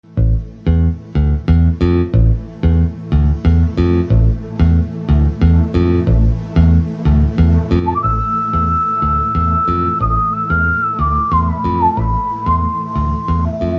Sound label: BGM Theme Telugu Ringtone